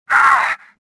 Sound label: pain; stofficer